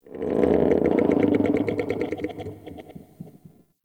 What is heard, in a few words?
Creature Growl; Warframe; Tau